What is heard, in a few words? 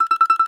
BAGLAMA, Plucked Strings; E-MU Producer Series Vol. 7 – Old World Instruments